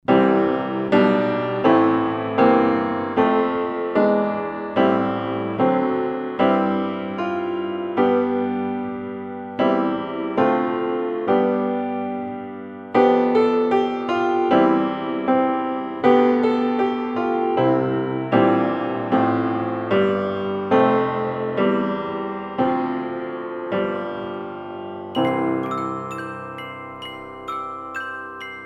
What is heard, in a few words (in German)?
Karaoke; Meister Jakob; kleines huhn kinderlieder